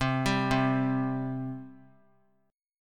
C 5th C down; C5 arpeggiated

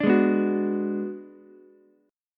B Minor 6th B, Ukulele; Bm6 strummed; 7,8,7,9; JGuitar

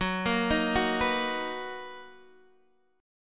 B Major 7th F sharp, chord

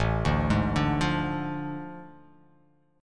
A Major 7th Suspended 4th Sharp 5th

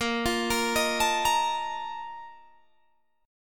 A sharp Major 7th Suspended 4th, Guitar Open G

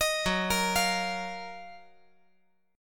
F sharp Major 13th; arpeggiated; JGuitar

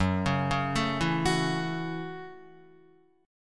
Gb Minor 7th, Guitar; arpeggiated; JGuitar

